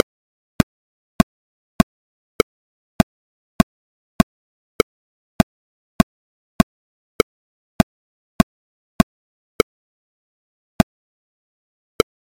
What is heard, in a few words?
metronome scaffold challenge